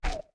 Zora Link Step

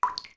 Beneath Well Droplet; zelda; OOT

